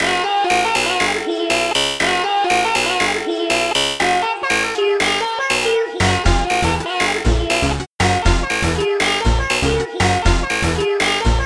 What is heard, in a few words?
RINGTONE